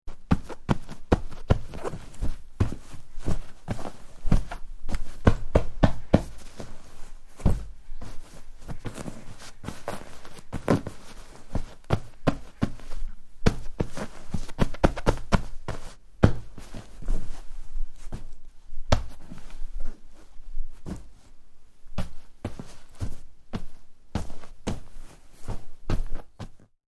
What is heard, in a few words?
Patting cushions to fluff them up; Living Room; Household